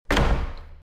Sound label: Door Closing